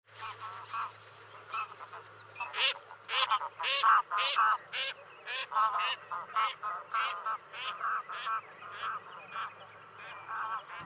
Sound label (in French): sarcelle d hiver, chant